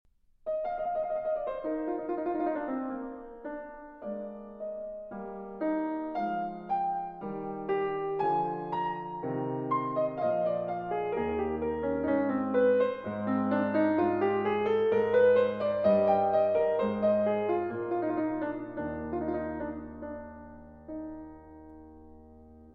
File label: Sonata in E Flat Major